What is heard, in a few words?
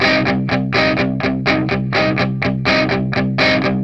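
125, Vintage Blues Guitar rhythm; USB Soundscan vol.22; AKAI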